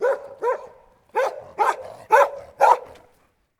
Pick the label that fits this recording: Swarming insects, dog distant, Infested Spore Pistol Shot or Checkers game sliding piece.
dog distant